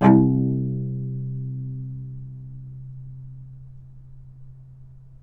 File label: C2 - Sforzando, novice cello